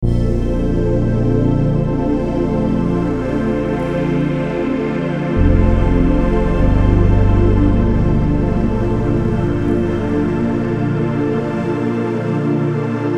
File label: Einfeld Chords